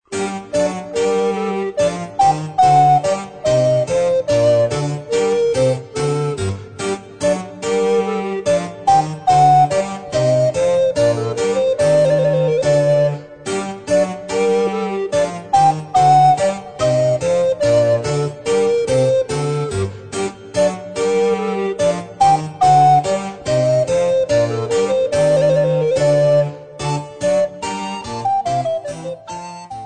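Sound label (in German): Gavotte, Altblockflöte und Bass; Stuecke fuer Altblockfloete und Basso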